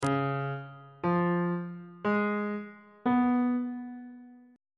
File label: f locrian 7th chord 6 is d flat dominant 7th